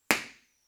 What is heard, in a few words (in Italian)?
IR microfono 1m